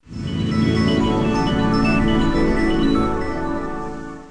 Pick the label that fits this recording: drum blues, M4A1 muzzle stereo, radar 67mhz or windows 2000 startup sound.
windows 2000 startup sound